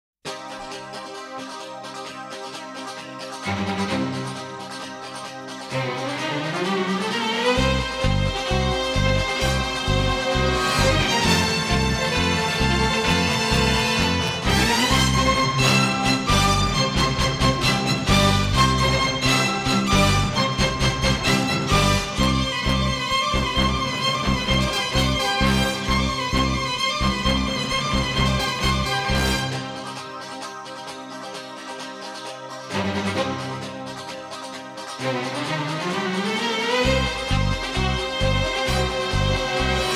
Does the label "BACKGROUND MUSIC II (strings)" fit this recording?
Yes